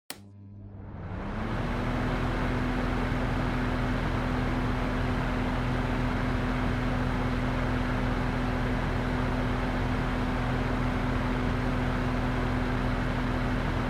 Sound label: Air Circulator High